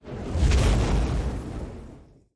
attack; Skillzgaming; battle Mania Desktop

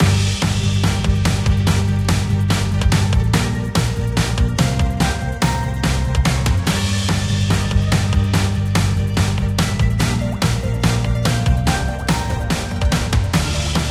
Bonus Music Loop; Romanov Riches

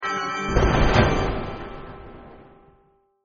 bonus win; vampire

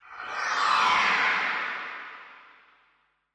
Reel Spin Loop; Action Ops Snow And Sable

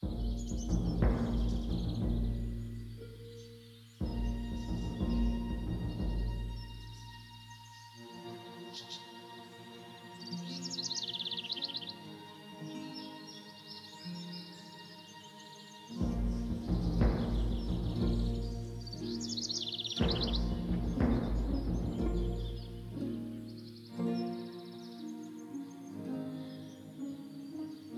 ambient; Rabcat; robin Of Sherwood Online Slot

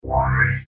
magnet pickup; Skillzgaming; mega Money Rush Desktop

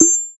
bet btn; astro Legends Lyra and Erion Desktop; Foxium

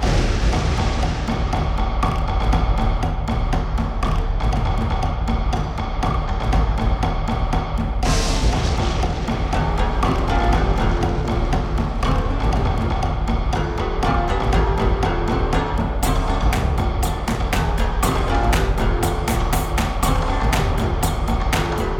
Bonus Loop; Wu Xing

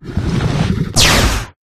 ranged; Recode; rampage riches

